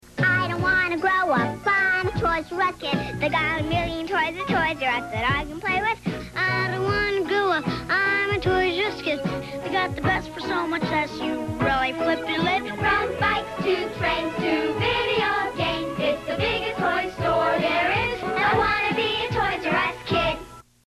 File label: JINGLE; brand jingles; ToysRUs